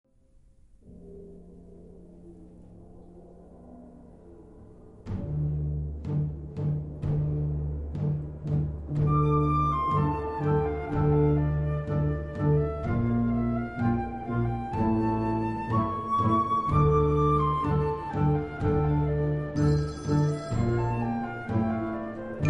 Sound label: cellphone suite